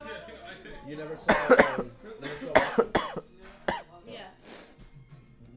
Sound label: Coughing